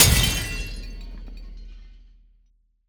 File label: Crash, cartoon Foley Fx; musicradar; cartoon caper